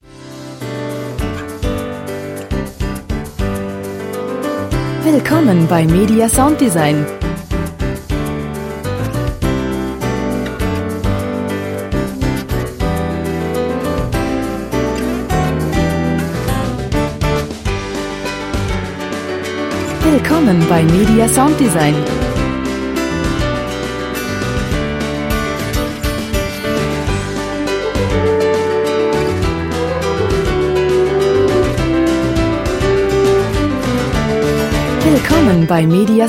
pop tex mex

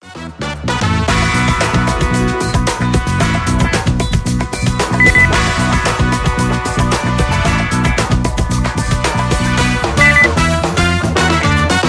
groovy

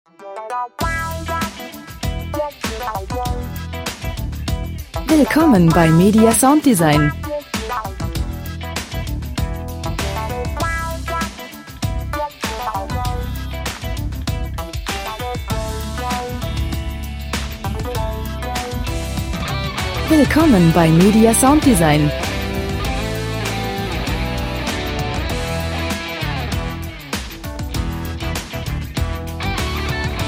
groovy liquid groove

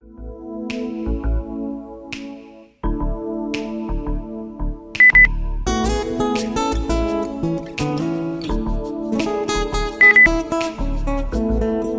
rock relaxed afternoon

